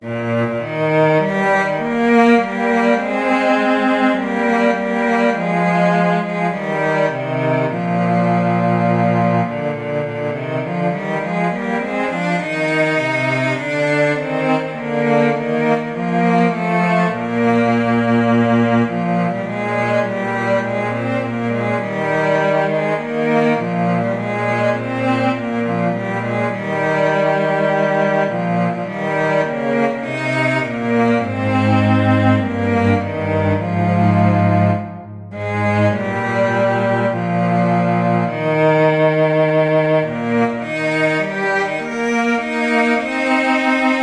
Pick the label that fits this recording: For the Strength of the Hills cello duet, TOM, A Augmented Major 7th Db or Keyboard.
For the Strength of the Hills cello duet